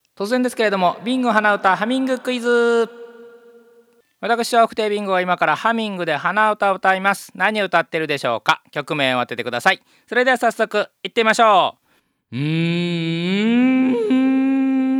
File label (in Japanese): hanauta